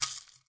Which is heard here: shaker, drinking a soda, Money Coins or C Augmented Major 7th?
shaker